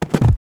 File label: reel bounce quick stop; netent